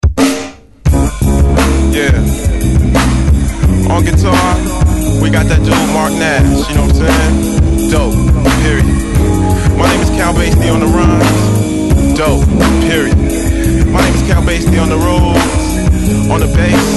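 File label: hip hop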